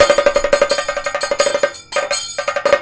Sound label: KATAK, Indian Traditions